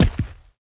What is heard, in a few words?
Footsteps